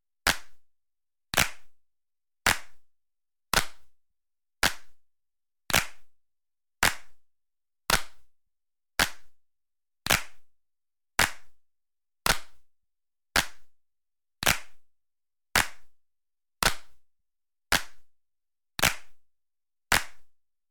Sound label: Claps